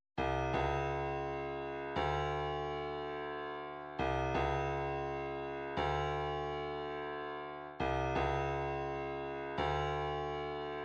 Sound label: 126 toms, midi; East End